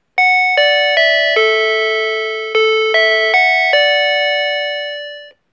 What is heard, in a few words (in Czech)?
melodie